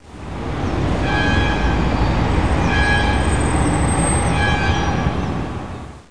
amb

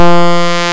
analog

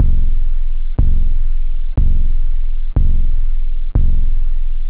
wood, drums technobd

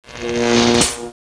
man O War Fire; NETSTORM

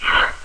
Text Box Woosh Long; Let's Discover Michigan!

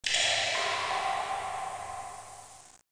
drip

